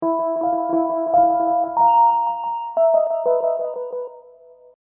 Hip Hop Dark Rhodes Licks 90 Bpm Straight E; keyboard loops

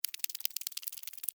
Insect Run Wet, Insect, Run, Wet, Slimy, Bug; Blastwave; Animals:Insects

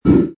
Metal Low wronk; Metallic Sound Sound Effects

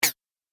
Droid Click, Robot Sound Effects

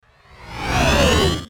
Whoosh Ascend Descend; Whoosh Ascend Descend Crescendo; Blastwave; Whoosh Sound Effects

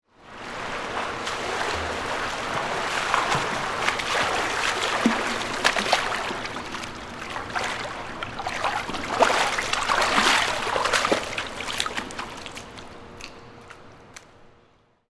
Pool Indoor Swim, Water, Splash; Toward, Stairs, Exit; Pool Sound Effects; BlastWave

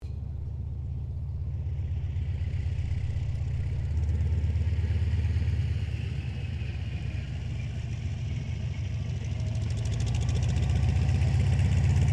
AIRCRAFT CA-28 CERES TAXI DISTANT; Airplane Sound Effects; Airplanes (Sound Effects:Vehicles)

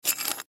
Carabineer Pick Up; Pick Ups (Sound Effects:Objects); Foley; Blastwave

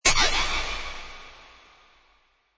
Alien Zap, Spaced out zap; Zap Sound Effects; Sound Design

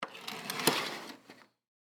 Curtain Open Fast, Household; BlastWave

